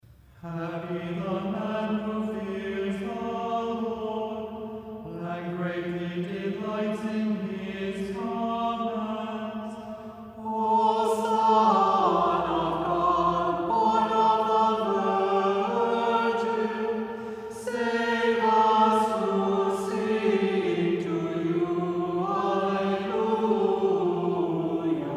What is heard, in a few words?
Second Antiphon; Nativity